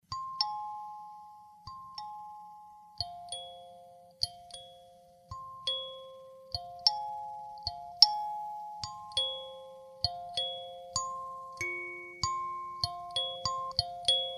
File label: kalimba